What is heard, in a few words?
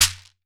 SHEKERE, LATIN percussion; Voice Crystal - Steve Reid Definitive Percussion; LATIN SET